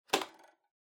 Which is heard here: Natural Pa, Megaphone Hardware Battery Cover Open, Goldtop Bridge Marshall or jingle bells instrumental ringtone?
Megaphone Hardware Battery Cover Open